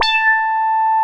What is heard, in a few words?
JUNO FUNK A6; Drums In Your FACE!